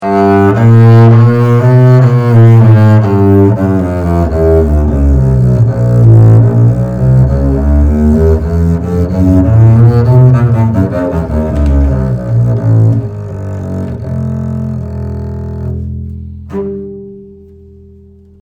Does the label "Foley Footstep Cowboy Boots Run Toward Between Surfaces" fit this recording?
No